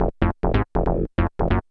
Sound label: SNTH BASS ELCTR 140 A, Techno